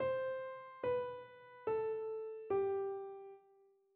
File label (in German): Tetrachord Mix c ab, mixolydisch; Tetrachorde